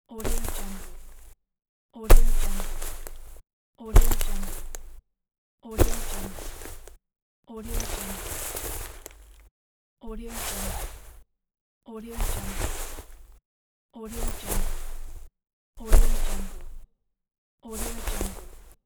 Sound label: clothes rustling